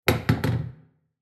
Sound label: Placing glass on shelf; household